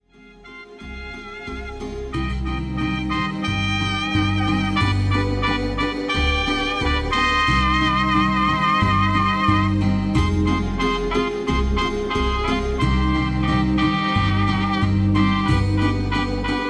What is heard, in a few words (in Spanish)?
Mariachi